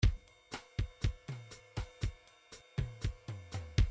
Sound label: midi grooves bossa; Bdf Midi File Grooves